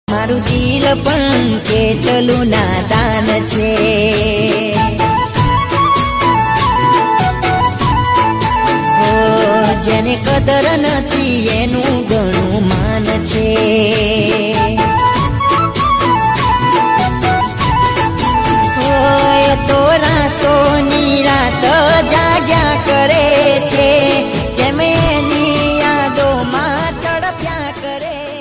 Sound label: gujrati ringtone